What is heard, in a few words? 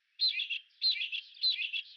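SCANDENS, Darwin's Finches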